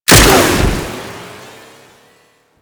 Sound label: Space Pod Rocket Launch; Grineer; Vehicles; Warframe